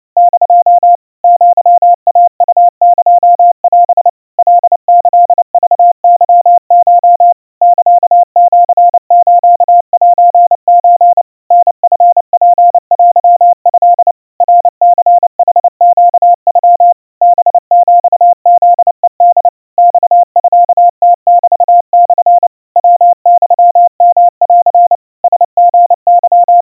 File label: aiueo 100 cpm 700 Hz Crispy, Morse